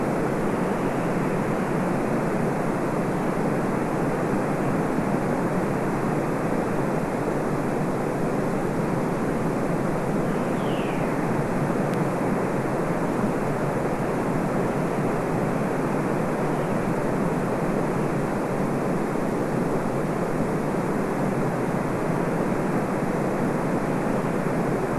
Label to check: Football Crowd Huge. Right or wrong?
Wrong